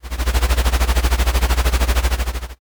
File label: helicopter